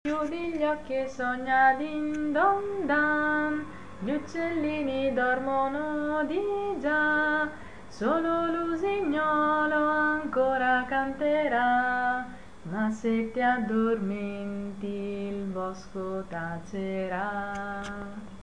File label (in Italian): canto Coccinelle; Canto di buonanotte delle Coccinelle; gruppo Agesci Vr12